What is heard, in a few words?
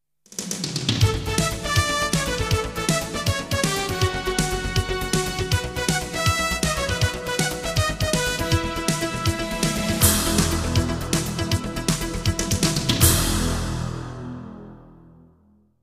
jingles; 90 DDD Jingles